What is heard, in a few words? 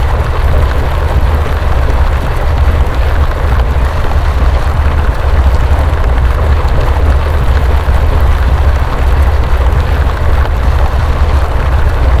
Plane Move; DCS